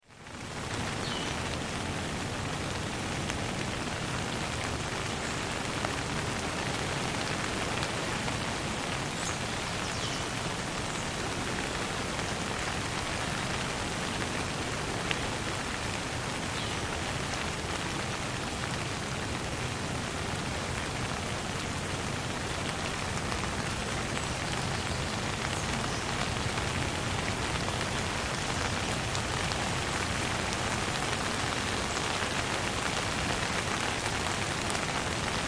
Light Rain Shower Lea

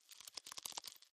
Bug Movement; bugs

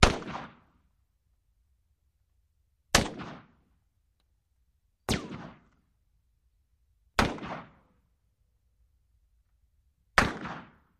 Russian SKS Single, sks 7x6

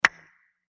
Bubble Pop Dry; bubbles

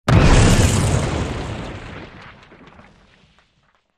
Explosion Rock Debr, rock & sand

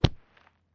Golf Ball Hit W 3 Iron

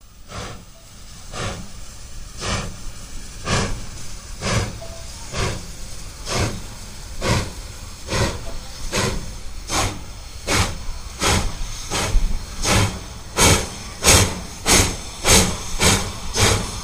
Steam Loco Daylight, steam train

